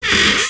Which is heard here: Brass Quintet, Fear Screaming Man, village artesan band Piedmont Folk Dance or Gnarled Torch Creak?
Gnarled Torch Creak